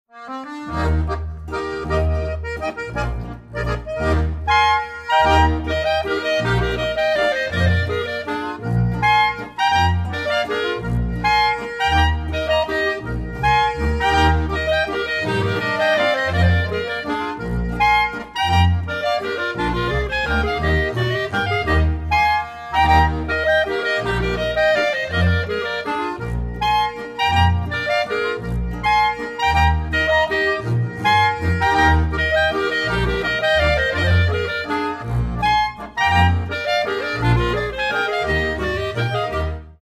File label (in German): Halbwalzer